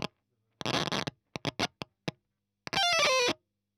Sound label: EL Fuzz End